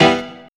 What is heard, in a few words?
HIT